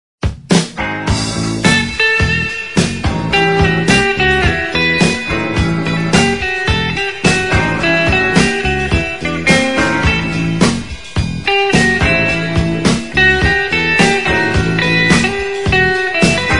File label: Six Strings Guitar; Blues; Rock